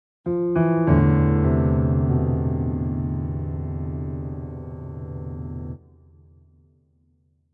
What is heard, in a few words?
Winter Distraught